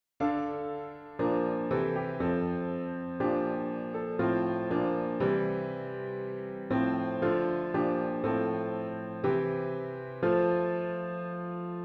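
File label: Hymn piano with Intro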